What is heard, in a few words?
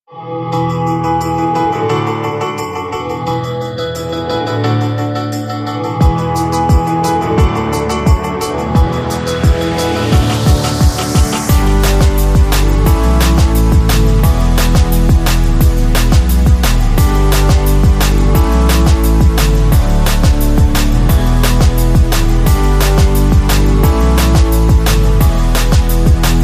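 Steaming Clarinet Short Rhythm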